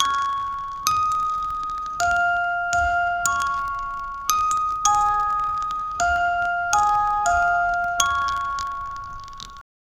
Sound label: creepy lullaby box melody, toy